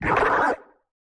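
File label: Flesh Damage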